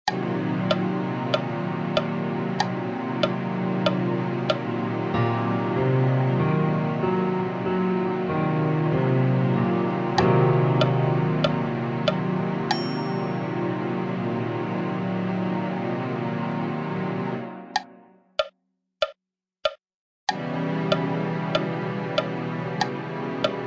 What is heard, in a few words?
min7b5 inv, ear training